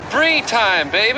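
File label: Is Calling R&B, ringtones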